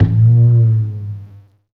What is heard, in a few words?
WOOD DRUM; East West - Phat & Phunky CD2; 90's Sample CDs